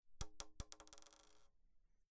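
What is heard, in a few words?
Pin drop liquid metal, acoustic guitar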